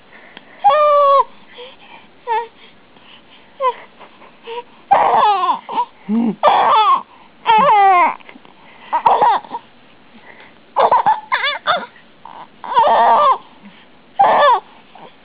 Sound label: laughing